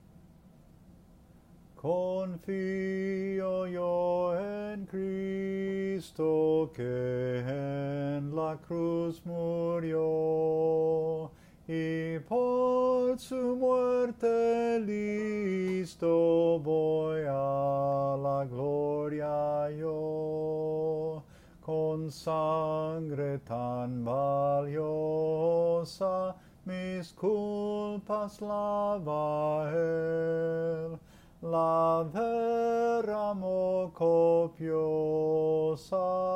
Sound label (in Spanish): melodia solo; Confio yo en Cristo